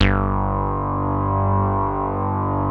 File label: MOOG BASS G2; Best Service Dream Experience